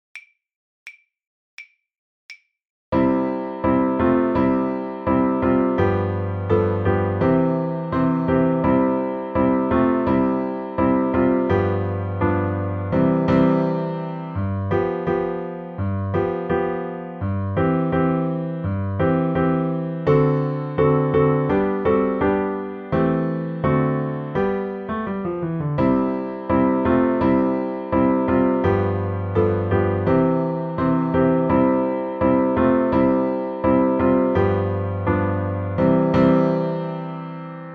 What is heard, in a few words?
accomp; London Bridge; The Violin Fun Book (Level 2)